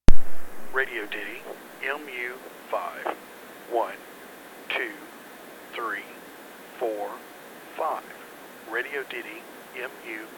radioddity mu5

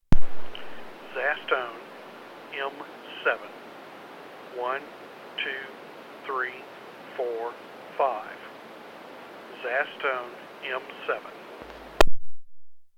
zastone m7

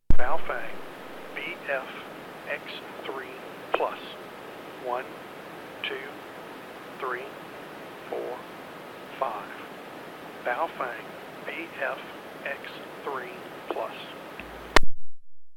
baofeng bfx3plus

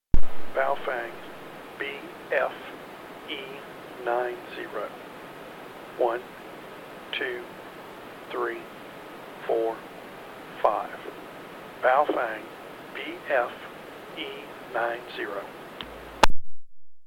baofeng bfe90

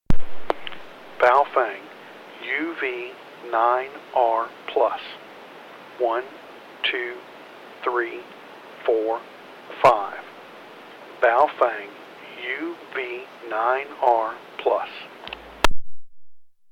baofeng uv9rplus

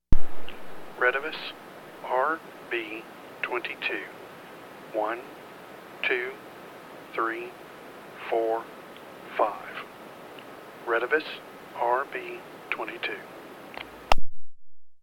retevis rb22

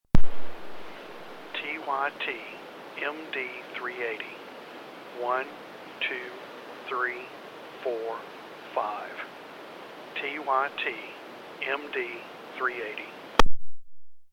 tvt md380

